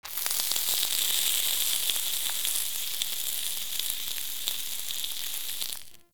cooking sizzle; Ambience; Props; Warframe; TheNewWar; Zariman

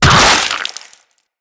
Impact Gore Large; Weapons; Bullet Impacts

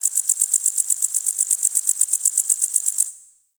Cricket; Ambience; Props; Warframe; The New War; Zariman

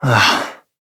Breath Relieved Adult; New War; Adult Operator

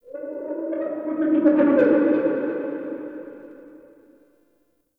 Unstable Texture; Ambience; Warframe; Shadowgrapher

